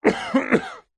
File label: human male man cough into cloth or arm muffled; zapsplat